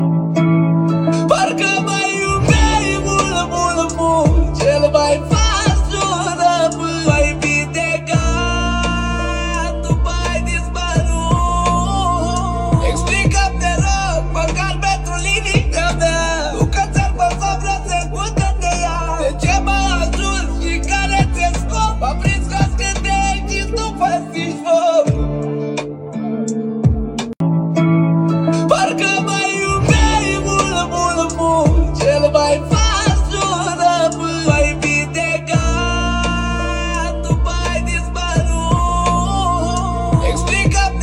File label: sax